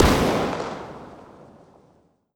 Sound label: Explosion; liblast